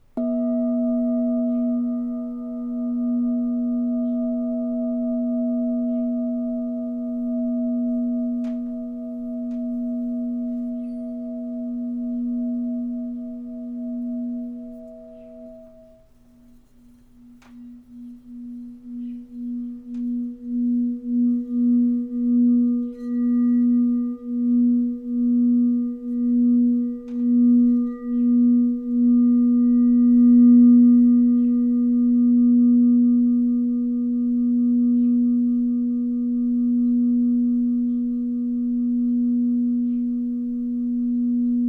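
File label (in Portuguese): TACA 432 B3, instrumentos de quartzo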